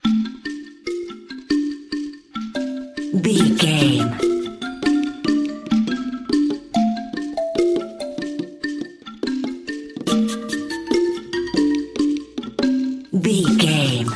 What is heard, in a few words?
rainforest expedition